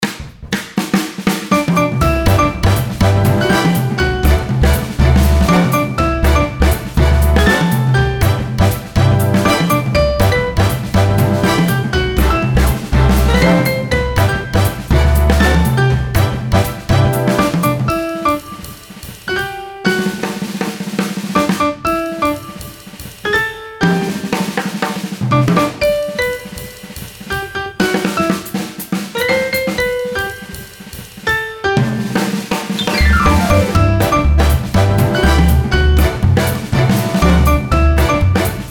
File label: happy birthday big band